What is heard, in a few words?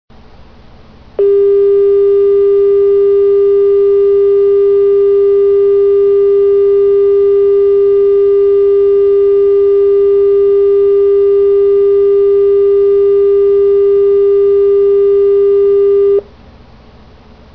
pbx